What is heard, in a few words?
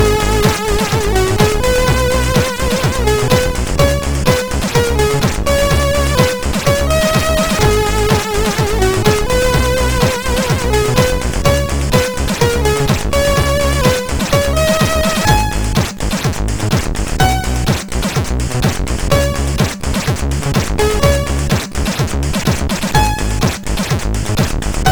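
sid CSG6581R3; MOS6581R4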